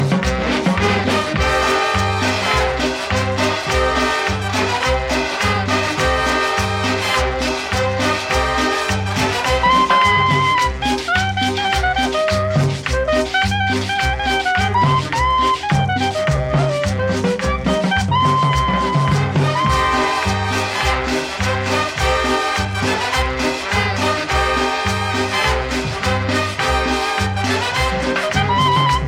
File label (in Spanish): Cumbia En Do Menor